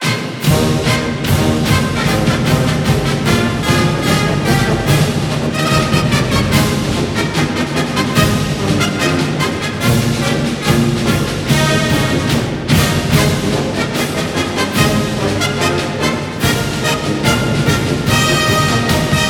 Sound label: Broadcast Outro